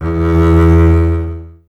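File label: string contrabass E; Miroslav Vitous; Solo Instruments VOL-2; Solo 2 Bank 1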